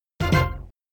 Bonus Add; Indiana Jones The Pinball Adventure; Williams; arcade

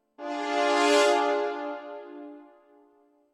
Sting Death

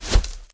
Footsteps; unreal development kit

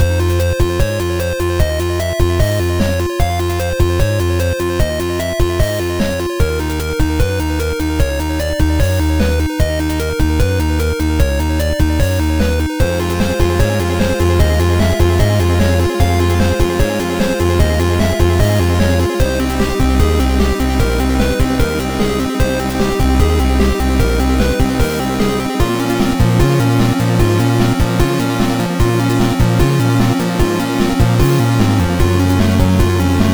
Vortex Loop

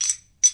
Equip Spinner; lego ninjago rise of the snakes

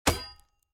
sword Wood

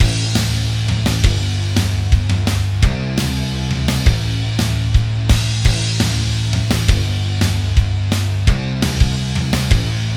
3d Music

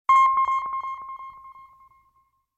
Level up; stardrift nomads linux macos windows gamerip 2017

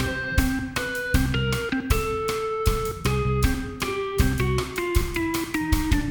City Platforming MIXED; ben 10

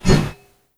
Heavy sword woosh; gamerip; shelter 69

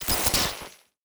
Glitch

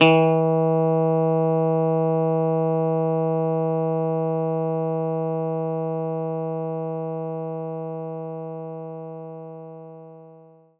Pick Bass D1

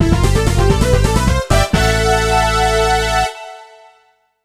Turkey; Super Monkey Ball 2; Monkey Bowling 2

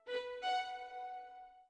orbit violin; Sky Children of the Light gamerip

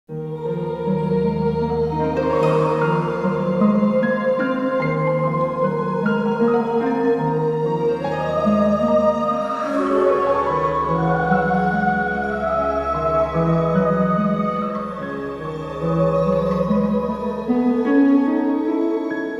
Town Ambient; trespasser jurassic park

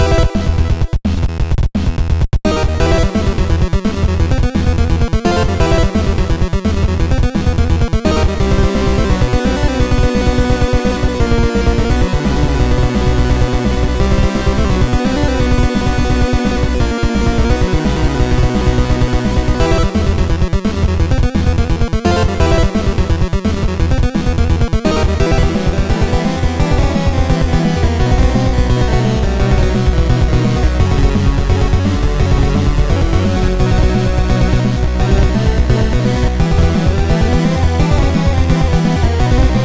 Boss Loop

